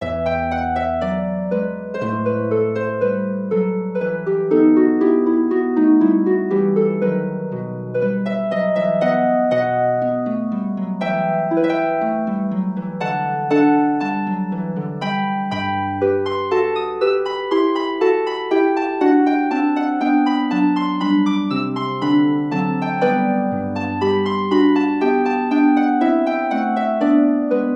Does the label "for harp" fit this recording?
Yes